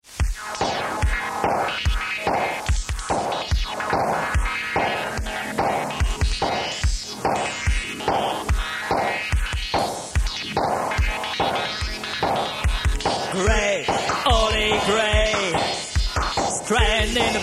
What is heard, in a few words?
thunderstorm